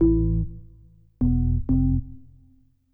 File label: organ house 125 A; eJay; Keys